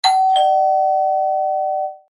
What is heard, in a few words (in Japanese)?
玄関のチャイム